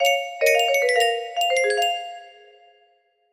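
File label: chime intro, music box melody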